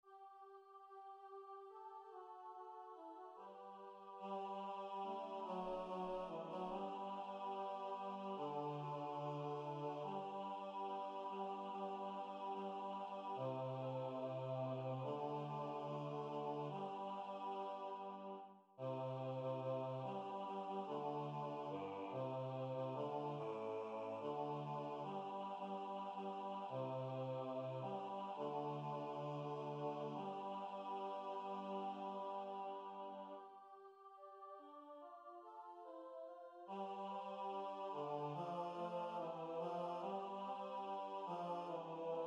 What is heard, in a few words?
Bass Mass Sanctus